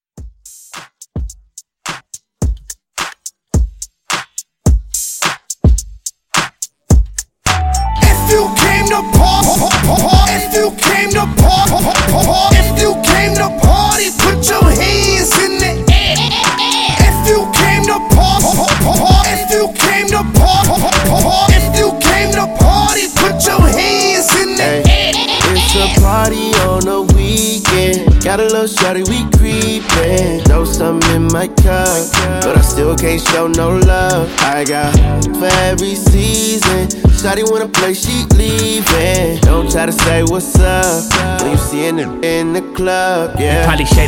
Hype Intro Out 107; remixplanet

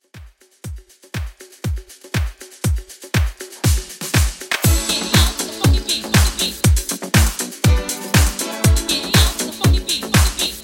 80s Funky Redrum, 120 bpm

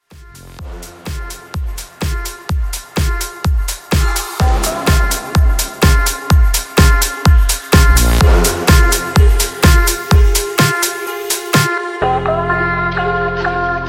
Progressive House Intro; Remixfreakz

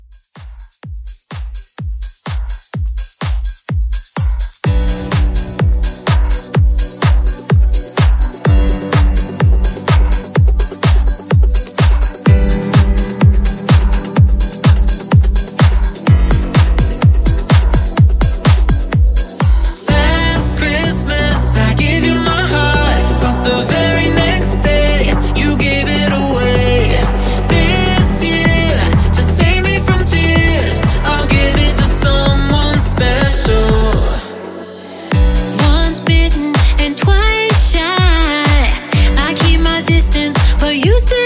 Club House Edit 120 CLEAN

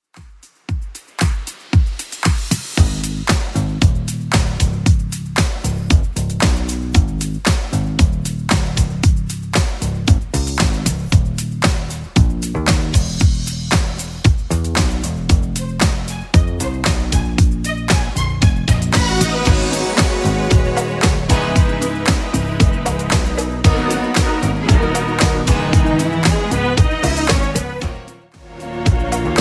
80s Club 115 bpm CLEAN

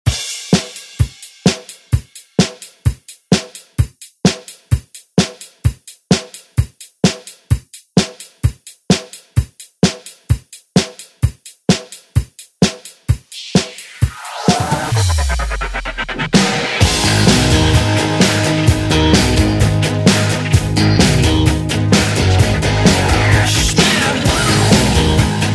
90s ReDrum; remixplanet

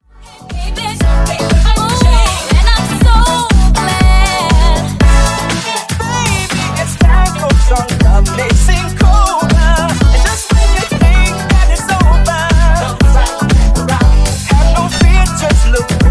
Funk Nu Disco House 120Bpm

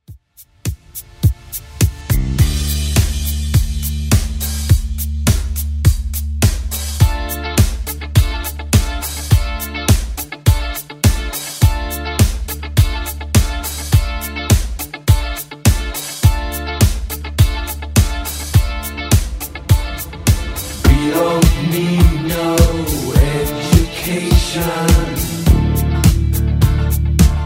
80s Rock DJ Friendly Redrum 104bpm

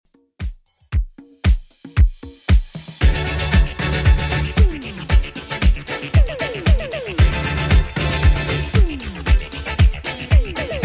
80s Redrum 115bpm

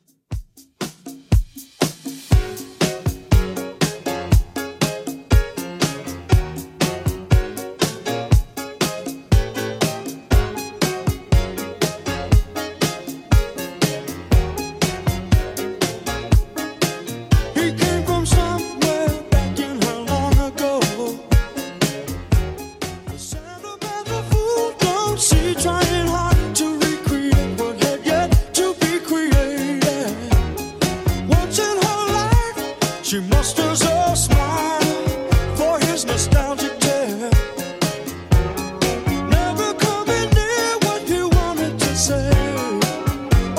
70s Redrum 120bpm; remixplanet

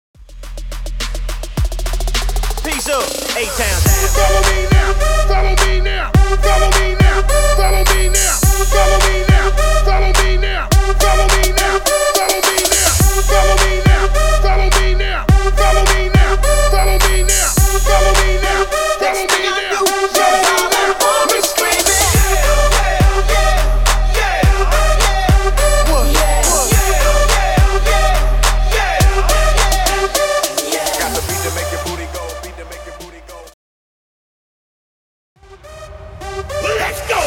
105 BPM; TRANS UP EDIT

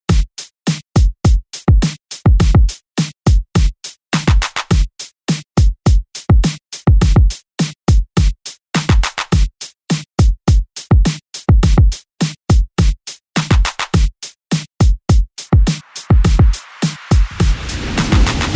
Redrum 104Bpm

